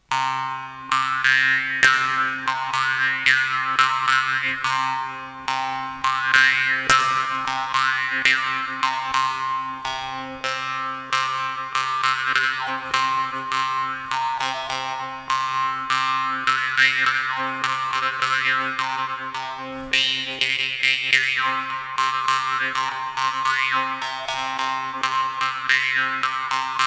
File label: echo